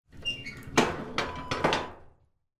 wood burning stove door close

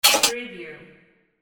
button

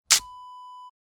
shovel push in

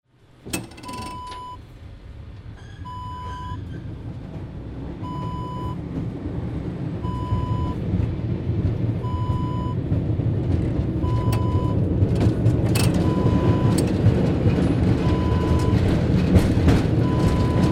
cable car ride

